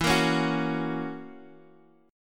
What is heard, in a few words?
F sharp Major E; F#/E Chord {X X 2 3 2 2} strummed